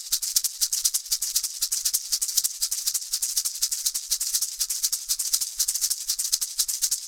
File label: maracas; gcompris; instruments